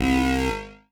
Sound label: trance lead